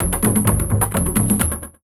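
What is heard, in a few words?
130 BPM; RHYTHMLOOPS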